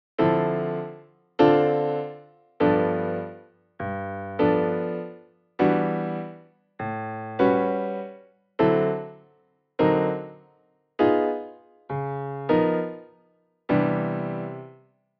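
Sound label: jazz chord progression; intro jazz blues lessons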